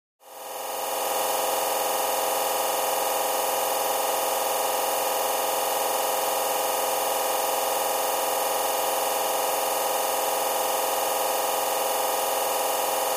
Buzz Loop Harsh

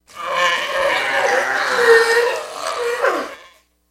Camel Multi Camels Ef, camels

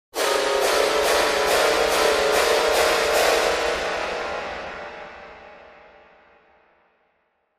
Piano Strings, keyboards piano

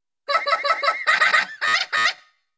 Female Robot Laughs, servos

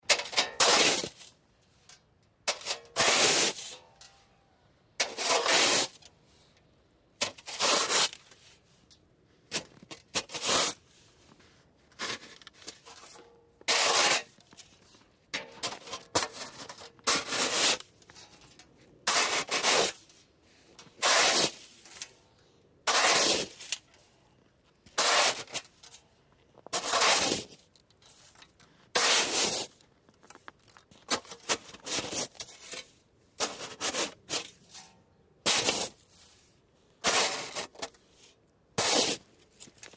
Shovel Snow Metal

